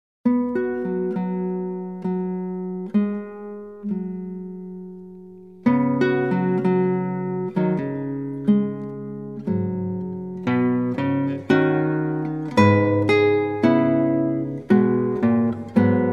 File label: Sarabande